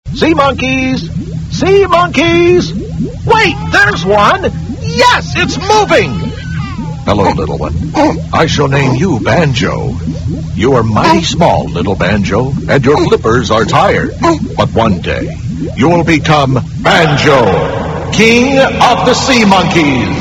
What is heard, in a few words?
banjo